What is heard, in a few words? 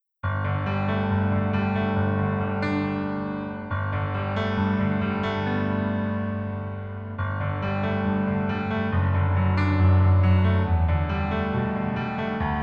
Piano ver; 낭만닥터 김사부